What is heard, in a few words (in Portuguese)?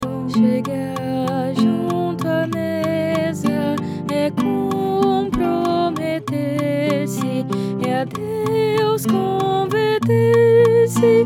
Contralto